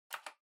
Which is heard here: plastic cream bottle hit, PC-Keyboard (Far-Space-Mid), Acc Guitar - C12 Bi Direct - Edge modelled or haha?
PC-Keyboard (Far-Space-Mid)